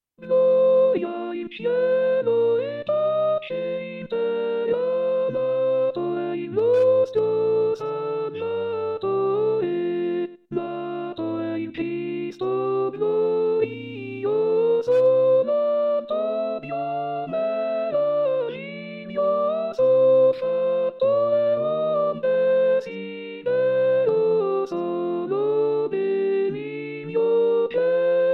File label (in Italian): Soprani; natale